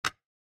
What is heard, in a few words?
clock; chat grid